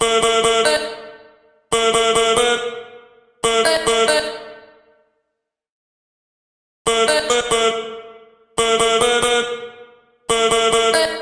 trap music

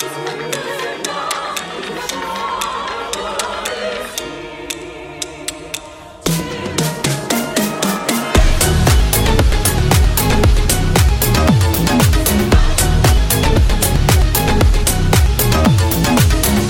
anime mashup

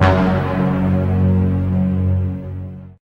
Suspense Medium

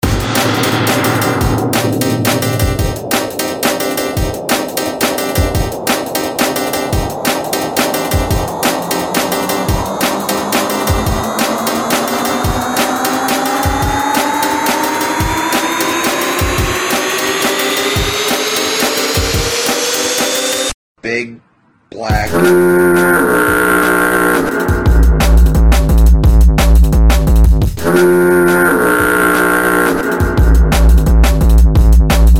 dnb foghorn; future of dnb foghorn rollerz

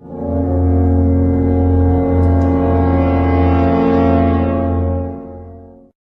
Suspense Long

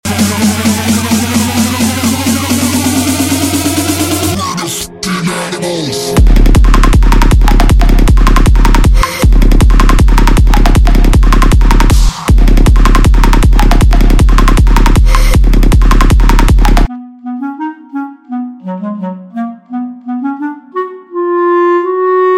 animals but psy trance

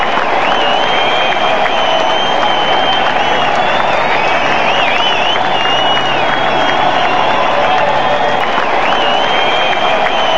Crowd Cheering

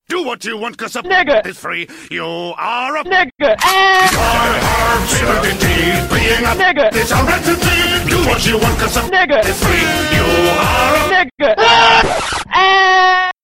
Pirate Song

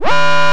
klaxon

